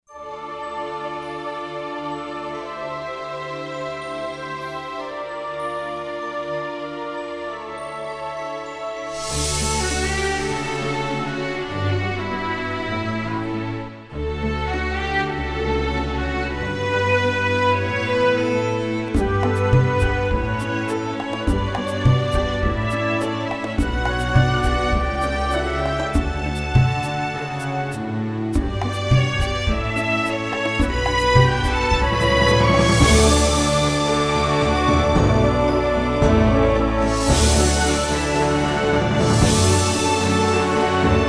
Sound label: Orch; Telemundo